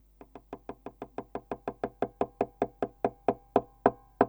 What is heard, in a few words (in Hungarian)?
kopogás emelkedő, guitar